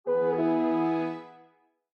zavershenie Windows Logoff Sound, zvuk vyklyucheniya windows